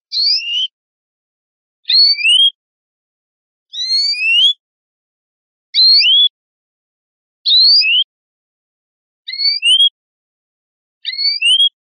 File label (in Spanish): quejas pui, verderon